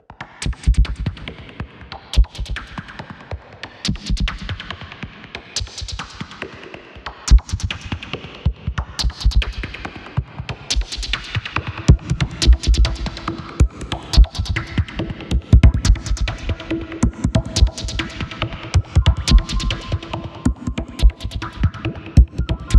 rain tone

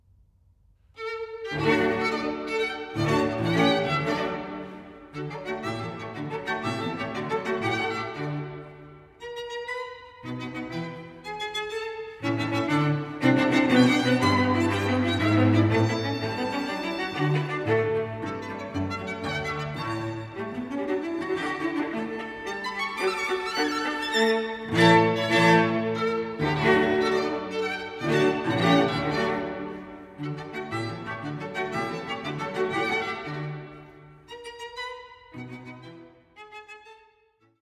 Serenade in D major, Marcia Allegro; Op.8 VII.

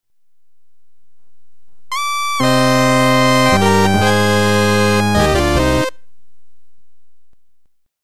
Game Over

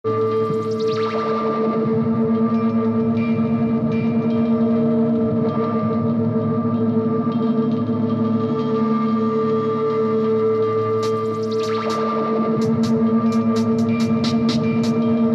synth sizzle

